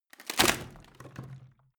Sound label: rotten wood break